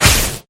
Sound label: explosion; Gem Master